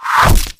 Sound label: player hit by arrow; Mount&Blade Warband